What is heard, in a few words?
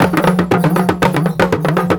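120 COMBO, drum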